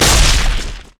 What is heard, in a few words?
crit attack; LoL; tryndamere